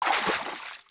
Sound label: FS Water Deep Wade, footsteps